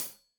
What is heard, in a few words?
Hat Tight, Hi-Hat, Cymbals; Deeply Sampled Drums